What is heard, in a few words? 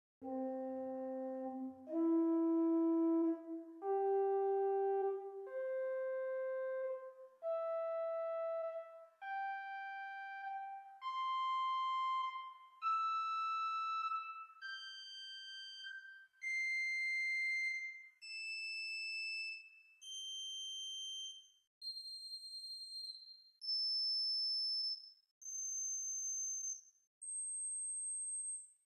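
Swell Piccolo 2 arpeggio, organ stops; St Annes Moseley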